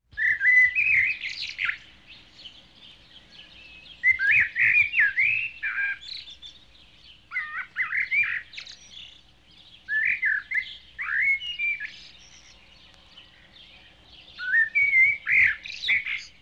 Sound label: blackbirds And Sparrows; animals